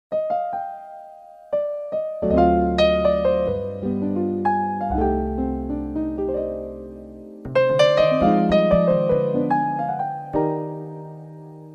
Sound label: Jazz Night Reflective